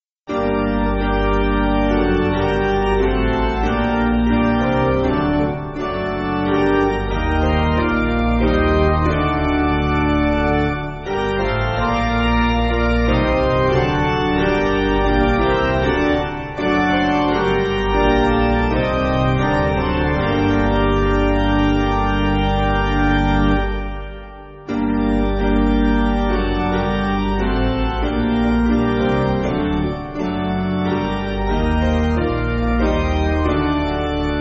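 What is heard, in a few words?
Piano, church backing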